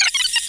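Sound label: attack heavy; mse6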